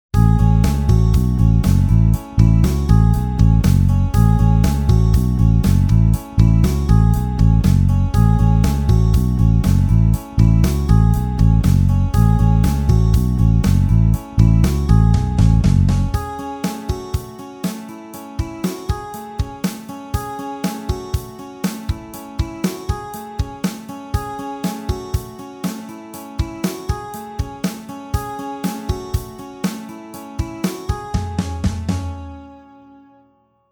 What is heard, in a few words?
A major Pop Rock 120bpm Bassloop